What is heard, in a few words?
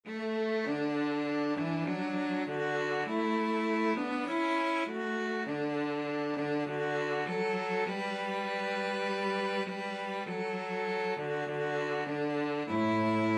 violin cello duet